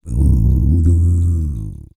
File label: CROON, World Voices, BRAZILIAN; Spectrasonics Vocal Planet CD5